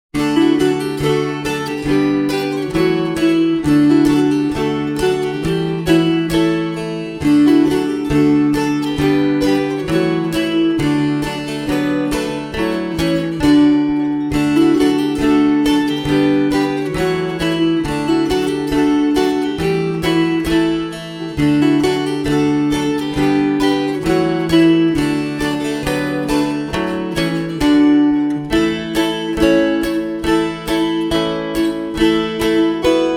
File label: Forked Deer fast